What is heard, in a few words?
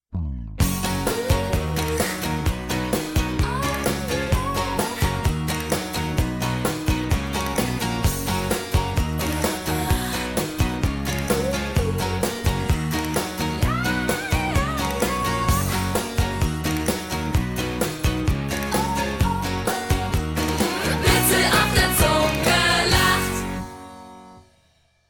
jingle